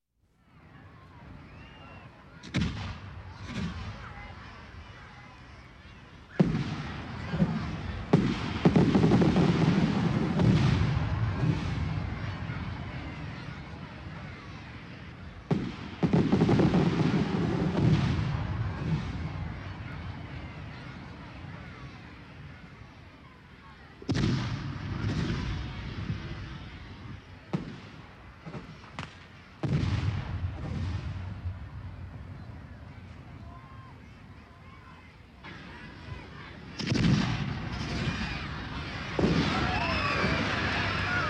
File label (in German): Grossstadtgeraeusche Feuerwerk Mit Menschenmenge